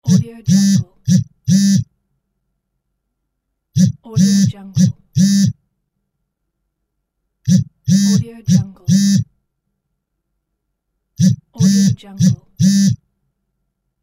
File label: phone vibration; dauzkobza